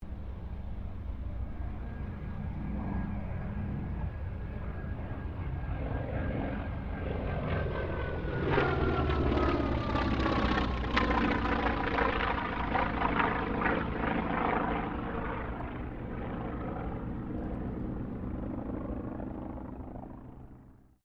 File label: AIRCRAFT T-28D TROJAN PASS DISTANT; Airplane; Airplanes; Vehicles